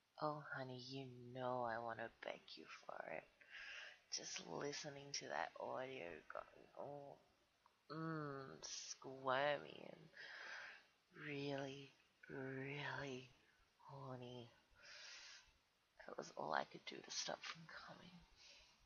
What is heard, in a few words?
Mmmmmmmmm